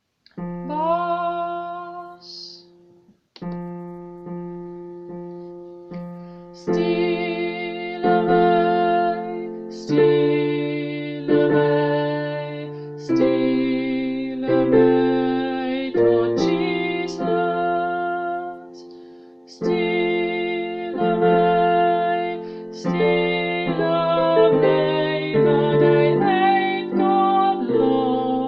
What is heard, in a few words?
steal away bass